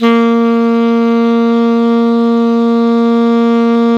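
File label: ALTO pianissimo A#2, Sax; Giga Samples Collection; ALTO SAX PP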